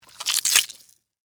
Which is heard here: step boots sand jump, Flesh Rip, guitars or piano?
Flesh Rip